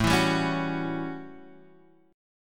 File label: A 13th A down, chord; strummed